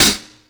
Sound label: Closed Hi-Hat; Drum n Bass; Hi-Hat One-Shots; KJ Sawka; Drum n Bass & Beyond; Drum Tool Box